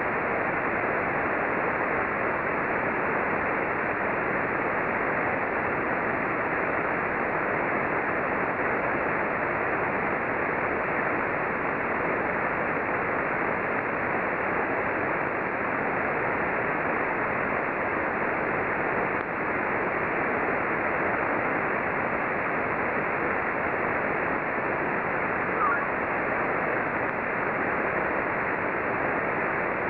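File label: Eagle Eye; vy short ping 200ms at 25.5s; Very short (200 ms) and strong ping on 15m, decoding only " HA" (TOL=100)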